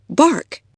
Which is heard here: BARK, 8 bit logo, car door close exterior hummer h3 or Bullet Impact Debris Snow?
BARK